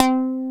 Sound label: bass FM; Best Service Gigapack 2